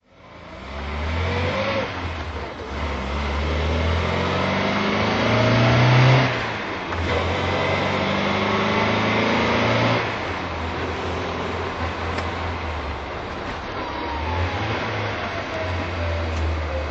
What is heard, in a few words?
volvo 123 gt driving